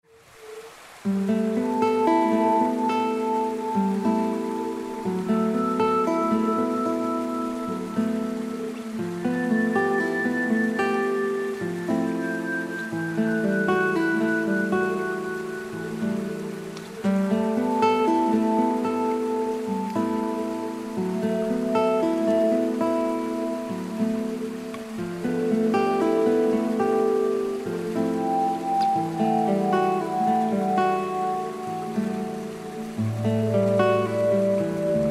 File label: Nature Guitar